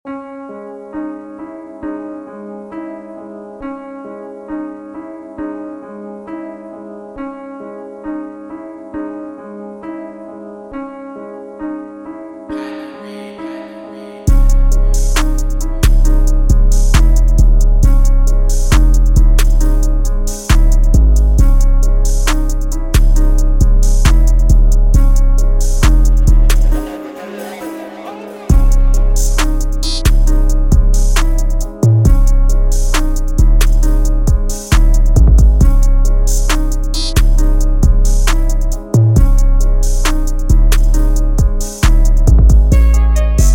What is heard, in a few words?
Instrumental